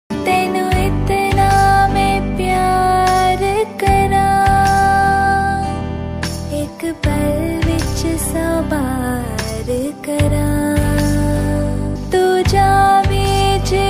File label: Female Ringtone